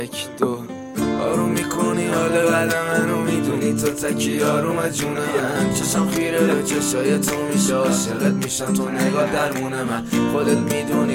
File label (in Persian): Gitar